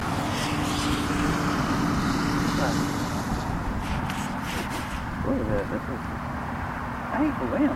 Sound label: more chains